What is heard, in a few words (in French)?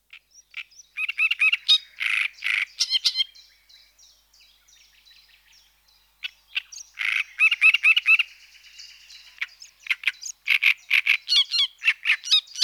Rousserolle turdoide